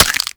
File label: Infested Minion Bite